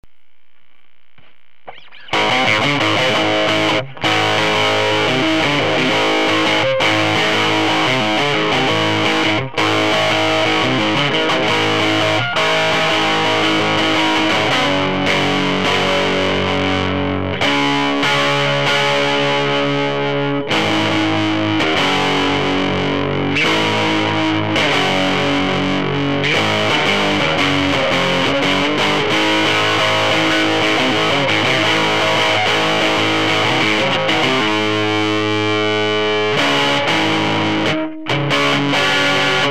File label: Strat